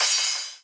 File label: drum hit finish